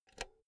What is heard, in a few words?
insert cinch